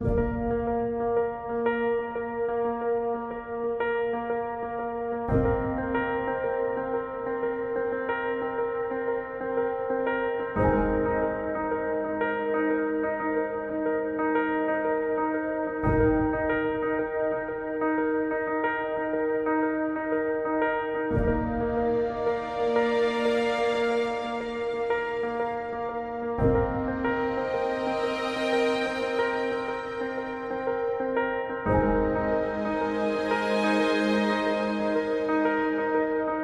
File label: Calm